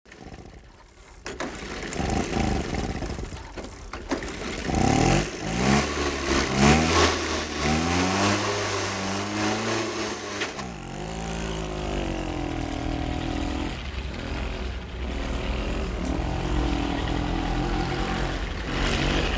vespa

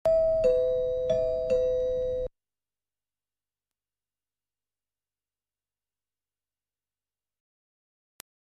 otro timbre

